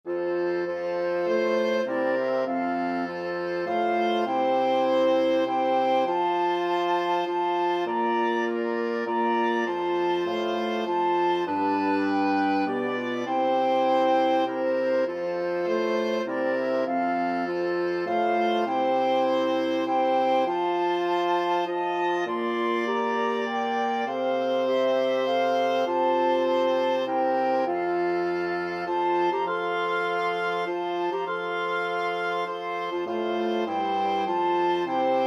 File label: wind quintet